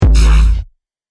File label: Laser Percussion Shot, Laser Gun